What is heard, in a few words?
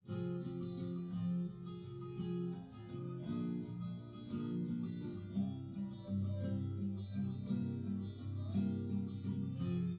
no drums live rec